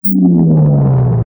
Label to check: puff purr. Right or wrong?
Wrong